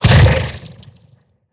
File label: spitter spit start behemoth, creatures